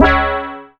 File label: C#, BELL GONG, Synclav FM; Big Fish Audio